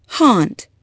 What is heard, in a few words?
haunt